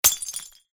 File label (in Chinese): 打碎玻璃